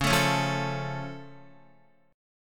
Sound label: Db Augmented, down, Guitar; strummed; JGuitar